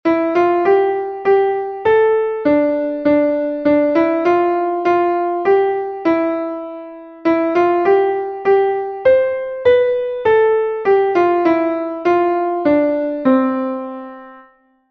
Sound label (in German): klavier; schneefloeckchen weissroeckchen; melodiemeister